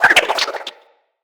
Grapple Rope By; Weapons

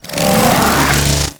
Bow Charge; Warframe; Corpus

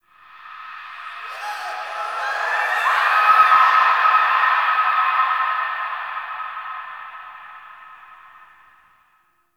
Zariman Memory Echoes, Ambience; Warframe; The New War

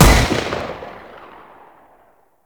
Heavy Pistol Shot Alt C; Warframe; Weapons; Zariman Heavy Pistol

